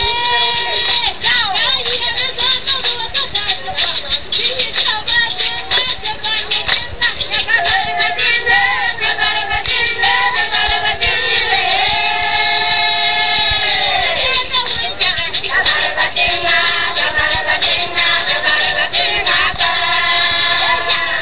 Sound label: Builsa Women Singers; Navrongo